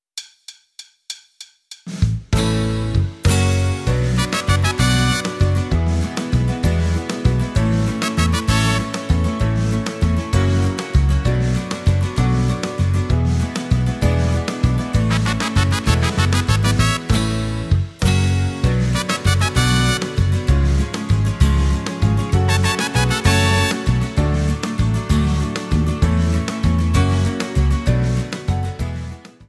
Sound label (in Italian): Valzer, BASE